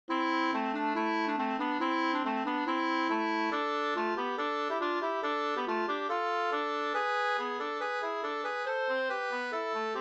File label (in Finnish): humppa; Cykelhumppa fi